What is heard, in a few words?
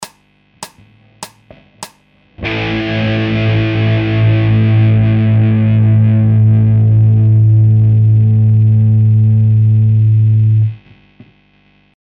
Hard Guitar Loops